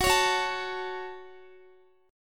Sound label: Gb Minor Guitar 4E,4A,5D,5G,5C,6F; strummed; x,x,16,18,18,16